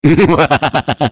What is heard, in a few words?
laugh; Defective Turret; sp sabotage factory defect